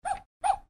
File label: rocker bark; Bouncer bark